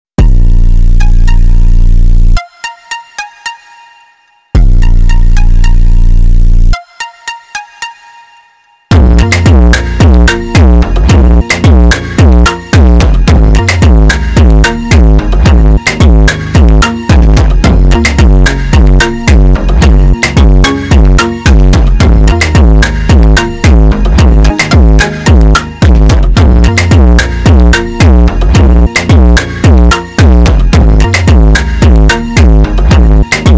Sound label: Funk